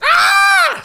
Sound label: scream